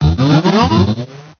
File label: talk